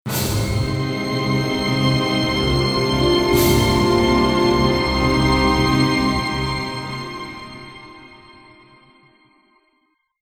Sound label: Success; Sniper Elite Berlin 1945